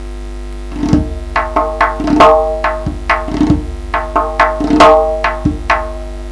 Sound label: 6 beat rhythm to be played in zourkhaneh